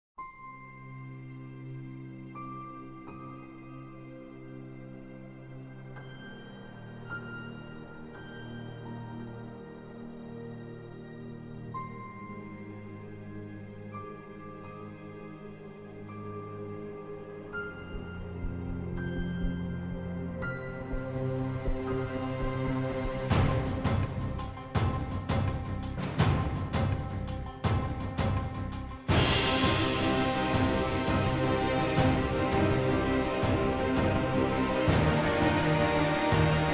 Epic Orchestral Cover

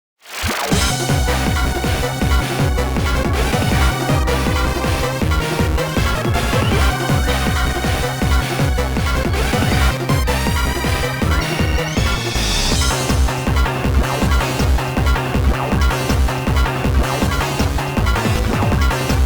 Duel Music; YuGiOh Over the Nexus

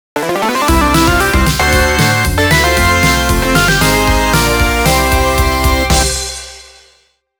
Stage Clear (Synth ver.)

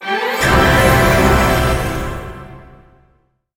Character Unlocked! Fanfare

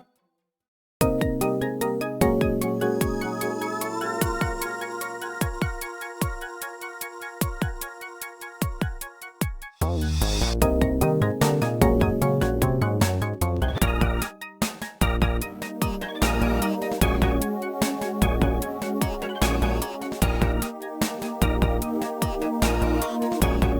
Map Music - Stadium; YuGiOh Over the Nexus

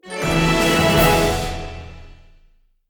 Spirit Raising Fanfare

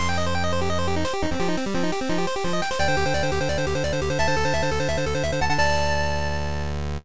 GB Sounds; Pokémon HeartGold & SoulSilver

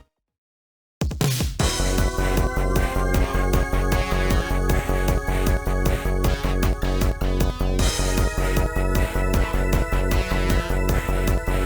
Duel Runner Music; YuGiOh Over the Nexus

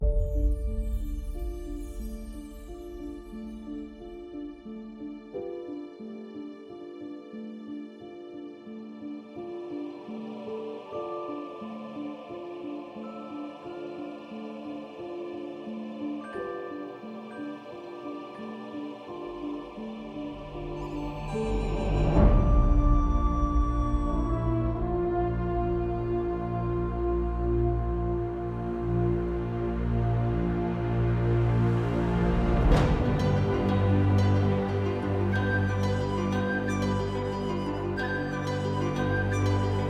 Epic Trailer Orchestration

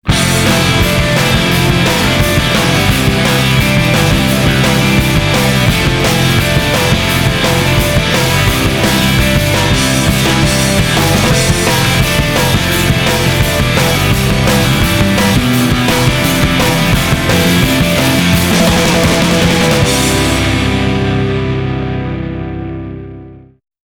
Off Vocal Version

